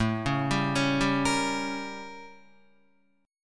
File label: A 7th Flat 9th A, Guitar; arpeggiated